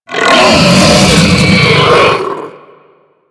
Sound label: Infested Juggernaut Vocal Death; Warframe